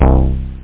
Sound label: solo bass